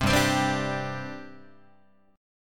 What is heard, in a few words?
G Suspended 4th G down Guitar; strummed; JGuitar